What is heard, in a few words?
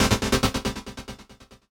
KEY SHOT TEKNO 140 A; Techno2; Singleshots